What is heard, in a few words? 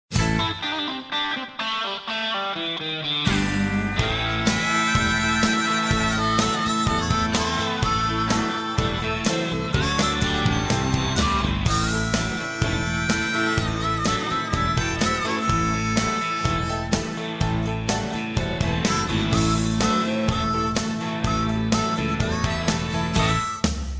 country